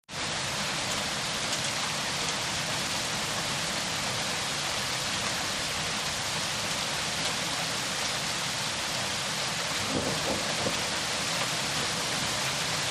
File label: Hail Mothball Size